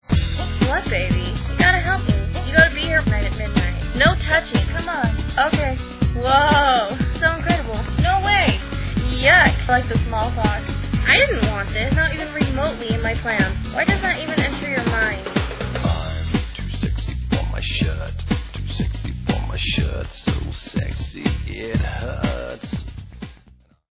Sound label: rintones